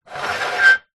plastic tray move scrape on wooden surface with screech; foley